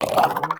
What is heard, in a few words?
Armadillo Vocal Idle; Warframe; Characters; Hunting Critters